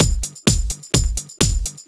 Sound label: Clubhouse Loop 128 BPM